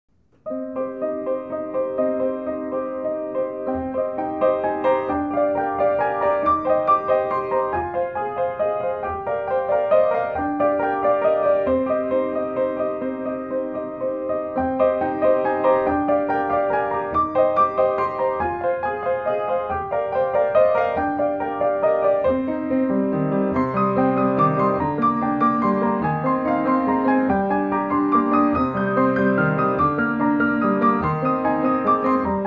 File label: Lullaby